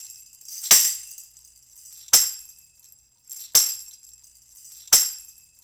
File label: Tambourine, 70s Analog Funk; Sonic Foundry (Sony Creative Software); What it Is! 70s Analog Funk; Session 15 - Wild Child